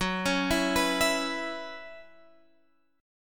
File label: Gb 7th Suspended 4th Gb down Guitar Standard, chord; arpeggiated; capo=3